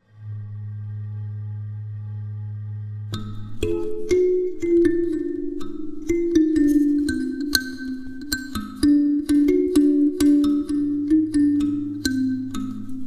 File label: tin with tines